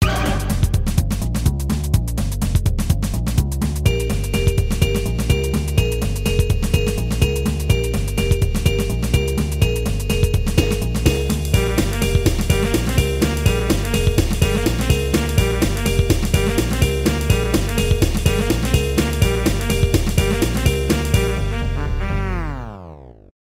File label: stinger